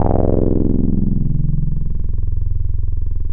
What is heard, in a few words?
Digital Pulse Acid; Synthetic's Serum Collection; Echo Sound Works Core Tables